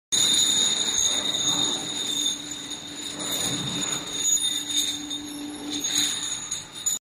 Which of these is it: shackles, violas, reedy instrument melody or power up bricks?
shackles